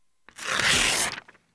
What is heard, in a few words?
ripping paper